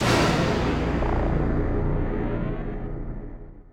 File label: Game Over; Killer Instinct Gold